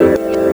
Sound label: Chords Tones; Equipped Music; Loungin House